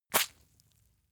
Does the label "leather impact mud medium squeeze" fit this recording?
Yes